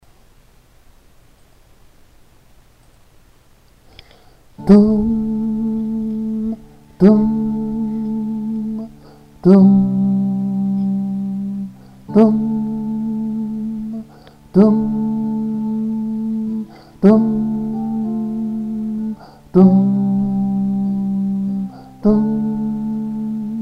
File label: tenor